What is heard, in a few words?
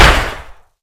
sweeper fire